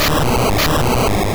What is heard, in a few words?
Egg Bomb; Pokemon; Gen 1; attack moves; RBY